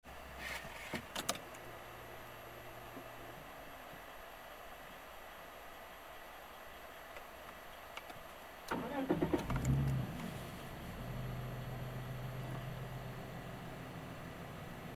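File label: engine START inside; 30RspecB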